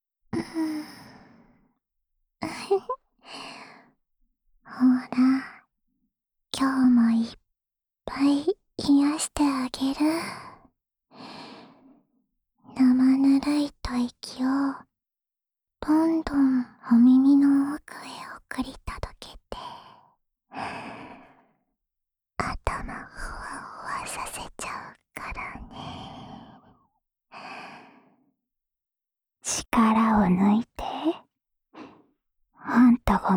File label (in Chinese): 安眠用吹耳朵循环（位置反转）, ASMR; 束彩和桐那的安眠用吹耳朵循环（位置反转）